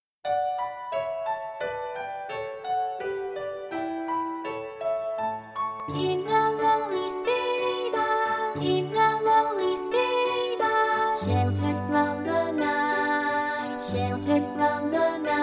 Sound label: Sings a Lullaby with Voices